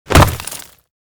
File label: Rock Impact Large Hit Scrape; Разделил; Звуки удара; Звуки удара для Форсакен; Rock Impact Large Hit Scrape 1 (SFX)